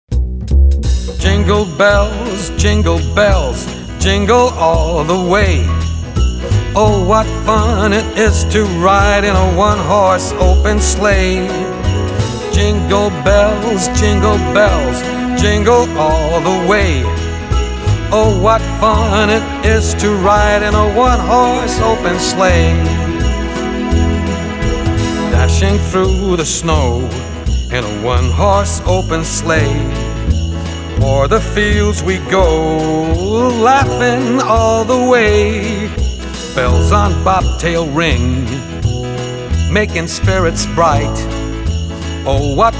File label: Jingle Bells